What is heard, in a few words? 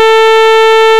sawtooth